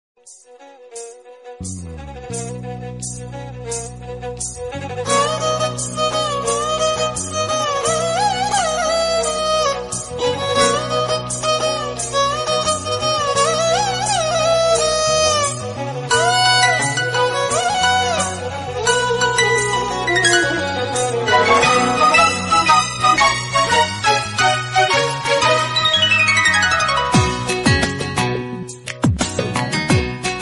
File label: bgm ringtones telugu; Anand movie; telugu trending songs